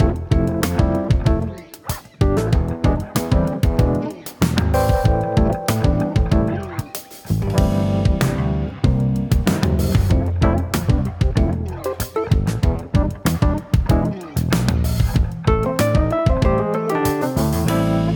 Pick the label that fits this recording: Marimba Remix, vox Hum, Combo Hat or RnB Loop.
RnB Loop